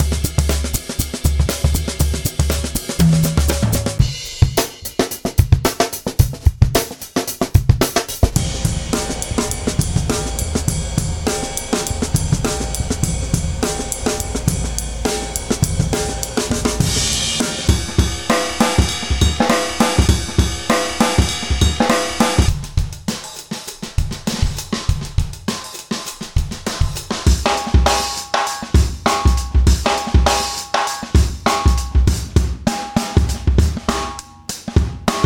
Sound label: mixed funk